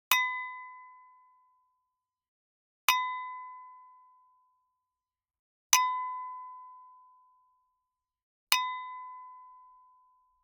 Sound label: Generic Click Glass Small